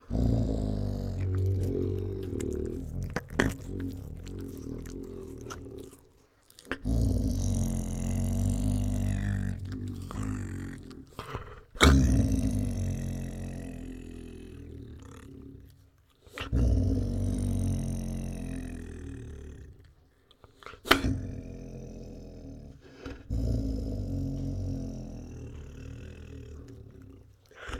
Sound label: Tiger Forcefully Growls and Licks; Tigers; Sound Ideas